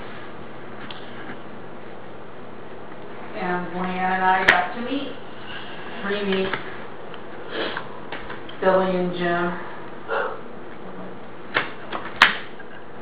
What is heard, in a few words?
laughing